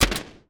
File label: enemy attack; kenney; tactical shooter